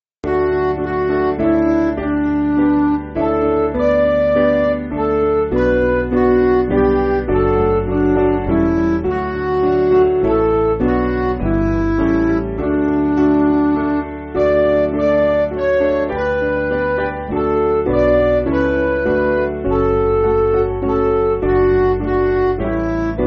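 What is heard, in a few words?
Midi, Music Backing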